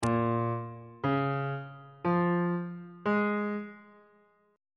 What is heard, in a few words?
b flat minor 7th chord intervals on bass clef